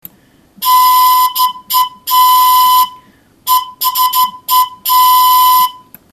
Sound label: straw fue